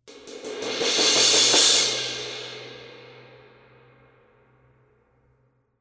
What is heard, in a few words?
buildcrash